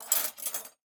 Silverware Pulled from Drawer, Kitchen; 1001 Sound Effects

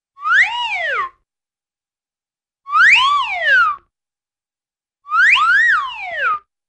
Ascending & Descending Whistles; Cartoon; Hollywood Edge; Cartoon Trax

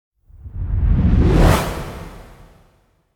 whoosh reverse; NCH Library

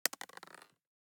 DICE on Plastic, Throw and Roll, Mini, 1 One Die; Samples; ArticulatedSounds; DICE Sfx Party (Extended)

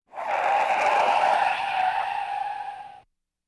Automobile Brakes Screeching Tires; KMP Film Video Sound Effects

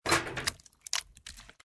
Rusted Cage; Blind Quest - The Frost Demon

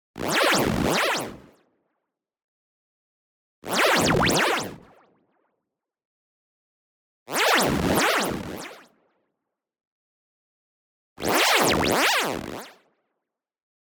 WOBBLE Gritty Delay; boom library; Anime Essentials; DSGNMisc

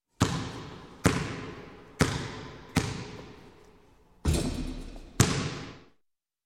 Basketball Shoot and Miss; Sound Ideas 2000 Series

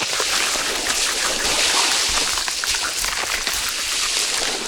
Scrape Flesh Loop; SFX Kit; Foley - Misc

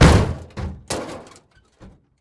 hood impact; Blind Drive

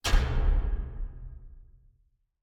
light switch on; Apotheorasis

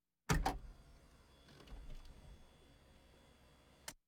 Automobiles various

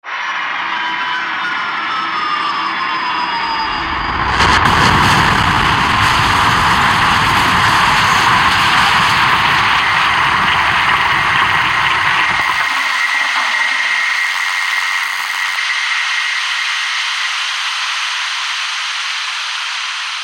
ship crash; Code7

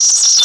Static; Blastwave

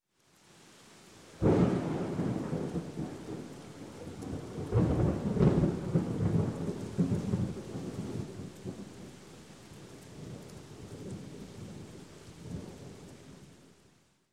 Thunder Clap, Rain; Sound Ideas 2000 Series

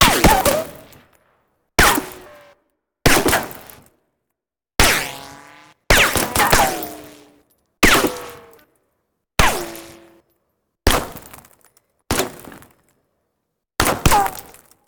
Bullet Ricochet; Sound Ideas; Guns

